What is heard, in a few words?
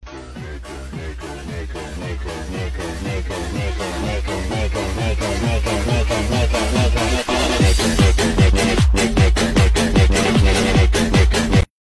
a very kool song